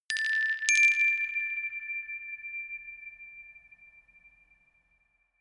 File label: notification glockenspiel rattle fourth up